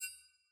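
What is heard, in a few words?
sfx notification chat received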